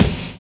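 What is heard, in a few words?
deep reverb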